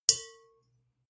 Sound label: bowl hit soft